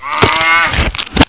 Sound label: bull whip; svencoop; hunger